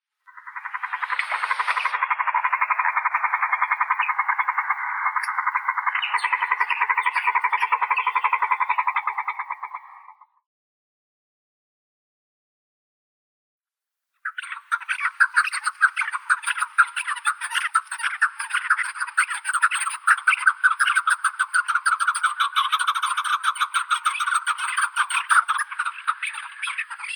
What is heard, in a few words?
chukar, Alectoris chukar; keklik